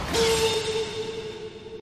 howl

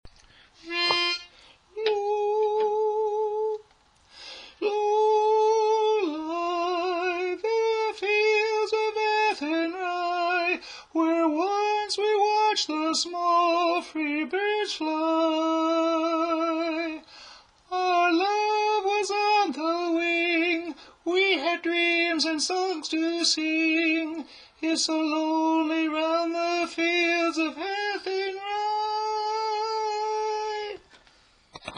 (Tenor)

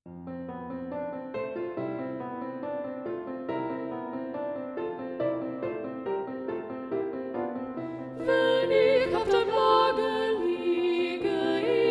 Balanced Voices; Abendlied

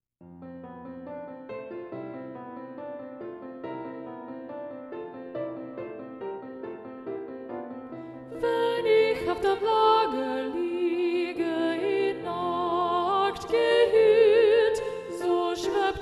SA - Alto Predominant; Abendlied

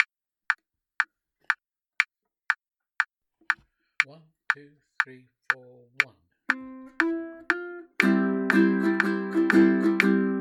Strum Practice File; When The Saints

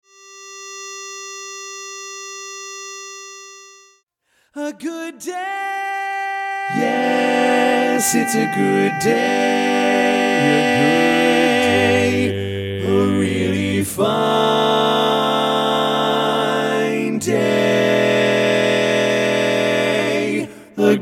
Baritone Predominant

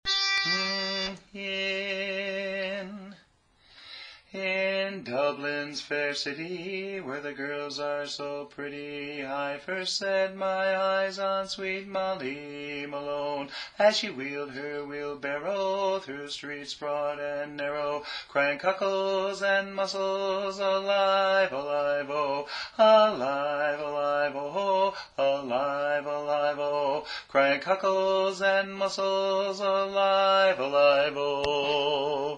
Bass; Molly Malone

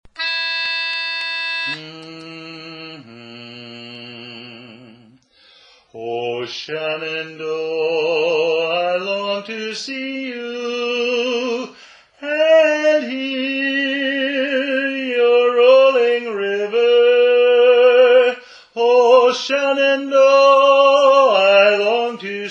Bass; Shenandoah

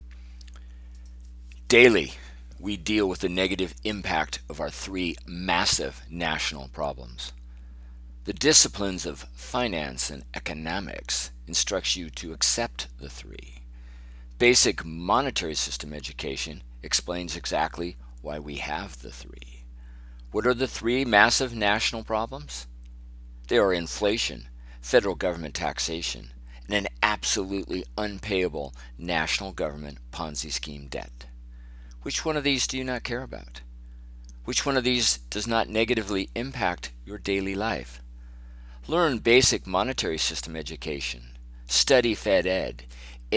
HEARTBEATS

